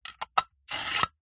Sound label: household knife sharpen in sharpener